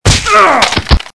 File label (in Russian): Ранение игрока